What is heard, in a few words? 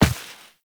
Walk Dirt, Footsteps; Operator